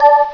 Select Chime; PowerPete Demo

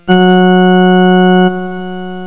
organ, notes; ClickArt 300,000

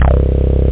Moog bass; Now Pop Quiz

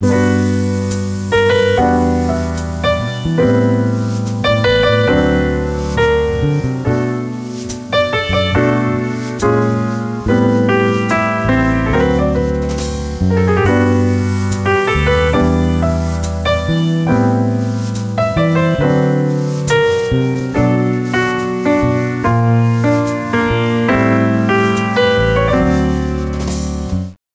jazz; Invenzioni E Inventori 1: Lavoro E Vita Quotidiana

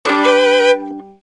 violin; cordas

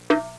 bongo drum, percussion instruments; Sound Effects for Multimedia - Volume 1

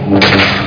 punch; saber

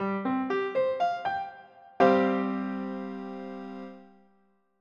C SUS4

